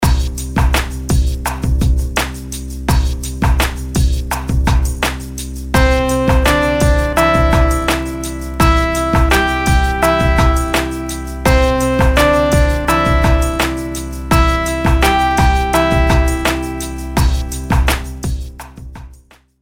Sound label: melodies simple Cmaj; melody